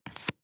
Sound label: reel stop bounce; arcane